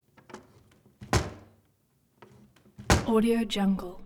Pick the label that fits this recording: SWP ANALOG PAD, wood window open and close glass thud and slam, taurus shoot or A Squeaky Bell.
wood window open and close glass thud and slam